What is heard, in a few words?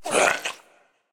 hitreact small